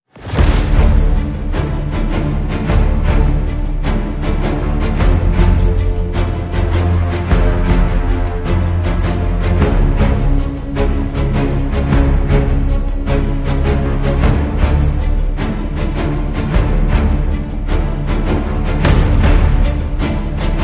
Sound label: No Choir